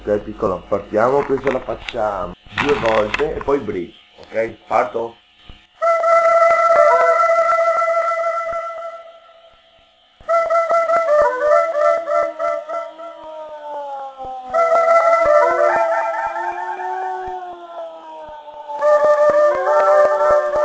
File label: Barking Trip